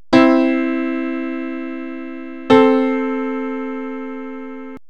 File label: Consonance